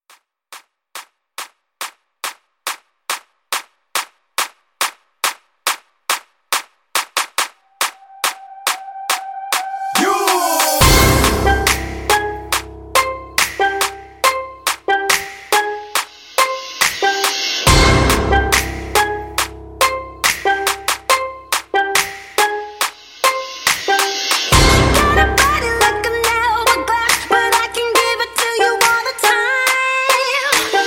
Clap

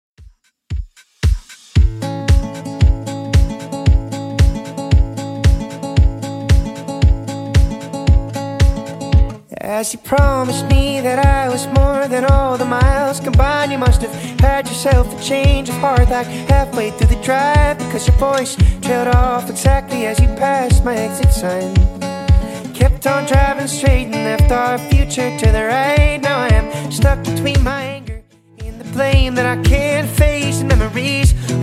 Top40 Redrum 114bpm

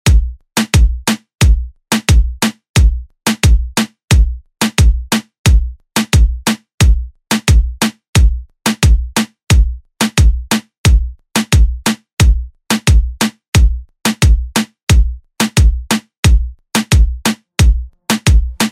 Latin Urban Redrum; Deejay Hit

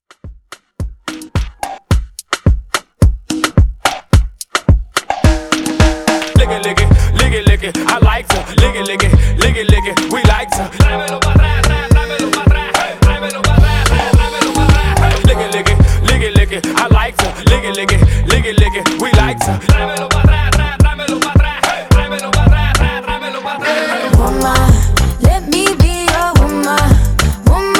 Hype Redrum

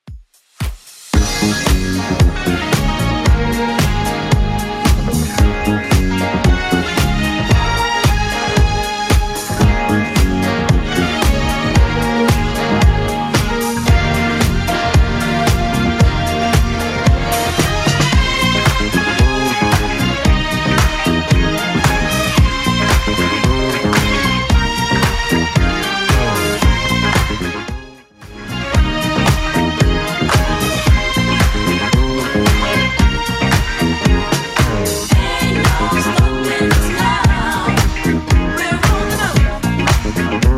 70s Redrum 113 bpm CLEAN

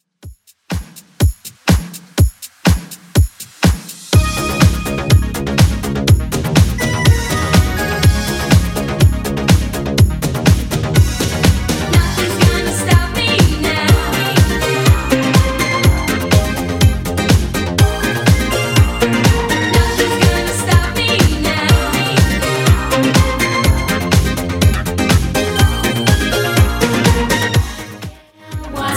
80s Redrum 123bpm

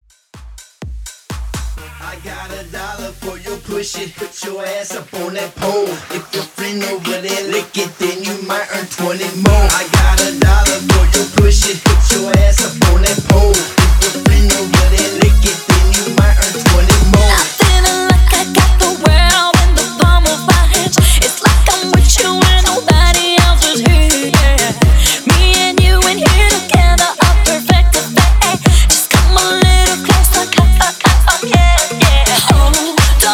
Throwback Hype Intros Uptempo 125 BPM; remixplanet

